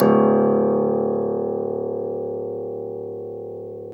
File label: PNO, Tack Piano; Club-50 - Foundations Roland